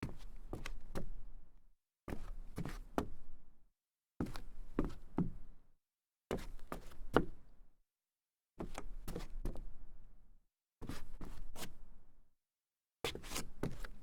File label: sneaker turnaround solid wood, foley